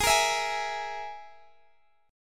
G sharp Suspended 2nd Sharp 5th, Guitar, Half Step Down